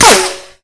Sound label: weapon impact stone; Spearhead Demo; MacFormat 2003 June